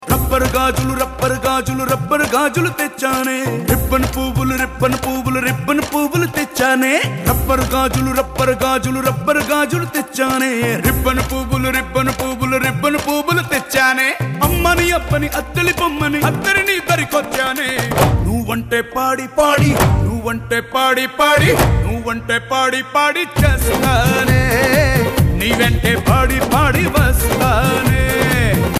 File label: Ringtone